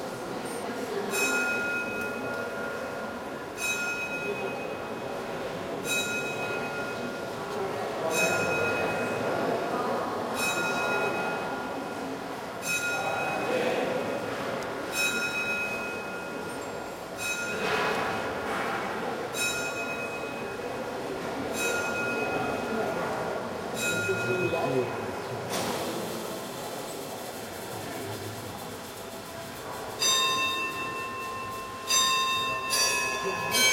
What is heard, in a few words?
astroclock rostock